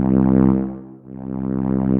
Solina Contrabass, String Machines, ARP Solina; Ableton; Factory Packs; Retro Synths

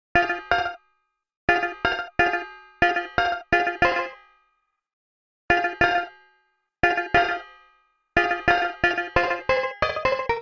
Melody F Minor 90 bpm; MIDI Clips; Tonal; Melodic; Plucky Ducky; Ableton; Factory Packs; Chop and Swing

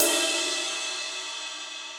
Ride Mid Room With A Vista; Drums; Drum Hits; Standard Hits; Ableton; Session Drums Studio

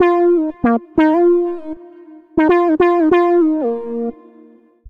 Bb Minor 89bpm; MIDI Clips; Melodic; Evening Casual; Ableton; Factory Packs; Chop and Swing

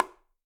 Bongo H Rim; Bongo A H Rim; Latin Percussion; Drums; Drum Hits; Ableton; Factory Packs

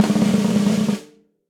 Snare Press Session Dry; Snare Articulation; Drum Hits; Session Drums Studio; Standard Hits

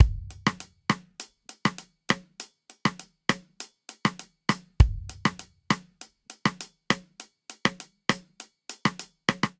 Reggaeton Intro 100 bpm; 4 4; MIDI Clips; Ableton; Factory Packs; Drum Booth

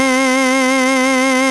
Synth Keys; Gamegrrl

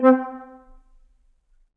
Alto Flute Solo Staccato; Ableton; Orchestral Woodwinds

